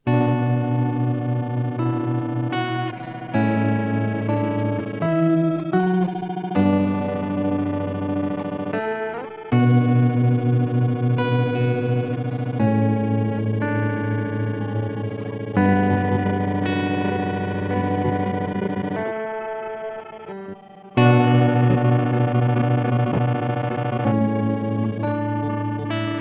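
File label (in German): Glitch schnell später mit Error; Chase Bliss Onward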